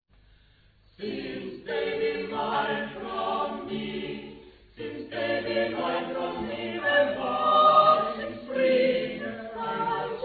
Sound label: baby